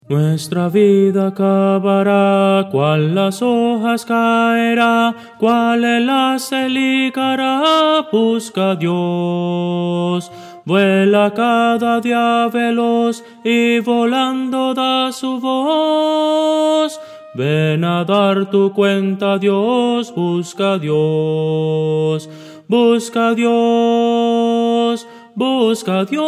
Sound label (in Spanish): soprano